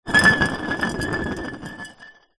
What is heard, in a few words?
glass bottle beer roll on concrete ground; foley